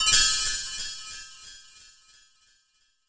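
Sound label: FX CHIME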